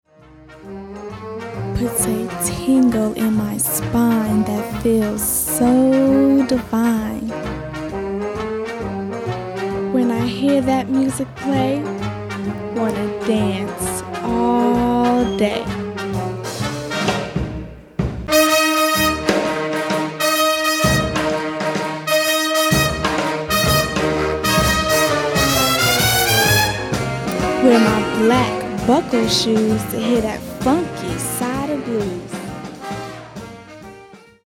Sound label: Funky side of blues